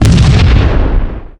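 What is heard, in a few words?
frag grenade explosion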